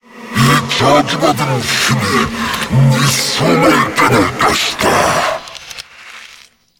lich skill

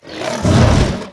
attack

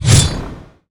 attack

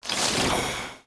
Lizard, mon

